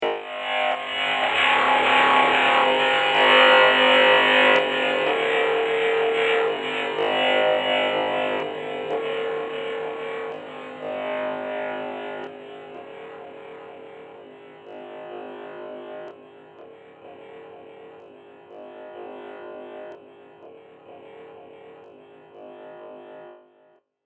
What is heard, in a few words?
Drone Of Destruction, drone sound effects; science fiction sound effect; star wars / trek style games; Andrew Fleming